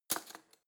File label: cutlery spoon drawer place